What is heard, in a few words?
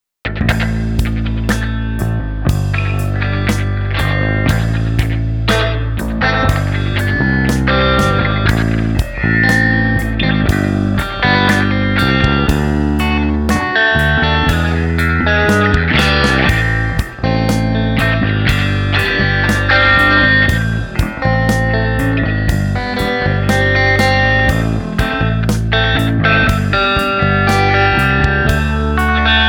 Muzak